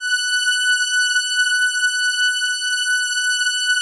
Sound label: Atmo Pads; Best Service; XX Large